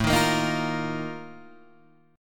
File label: A sharp Major 7th Suspended 4th